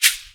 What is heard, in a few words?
shekere, drum machines